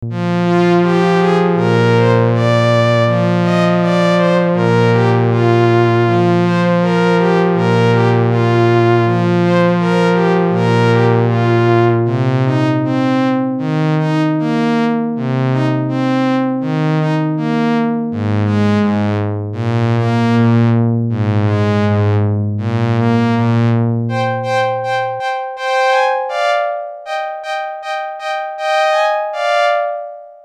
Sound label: Prophet4